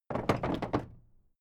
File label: old wood case wobble